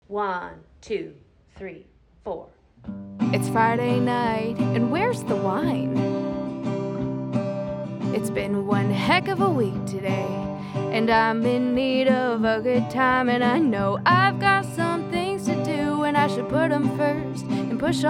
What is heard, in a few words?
female English Canadian country song